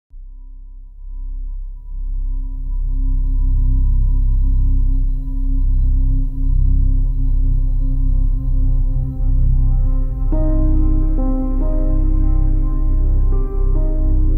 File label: trailer music industrial flashback epic dark hybrid horror